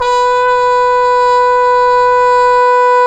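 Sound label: brass cup mute trumpet, dry; Roland LCDP12 Solo Brass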